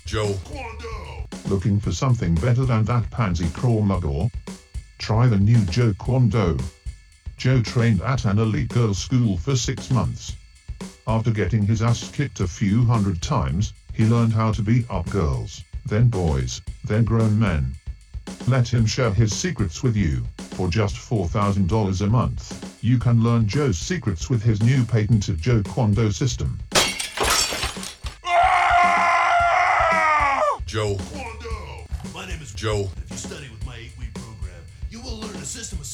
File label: full with drums